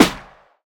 strong bottom end snare sound D key, acoustic snare sample tuned to the D note; snare drum samples